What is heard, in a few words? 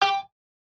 Message Ping; Chillzone